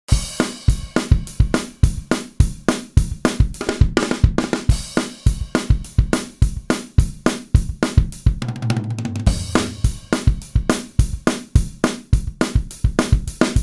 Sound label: Hard Rock Drums; Realsamples